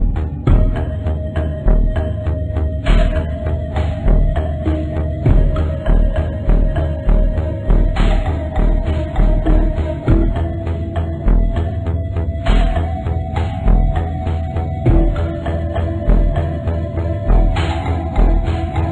horror music

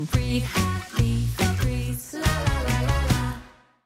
febreze jingle

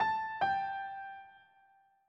piano

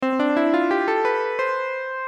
school bell warning

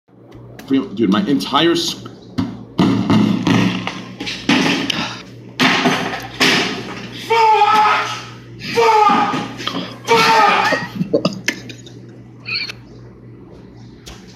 table stomp; trainwreckstvs

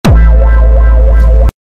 love wawawa

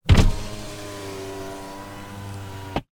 car window sound

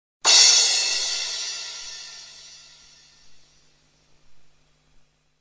cymbles; bunzo

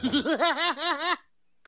ahh; krusty

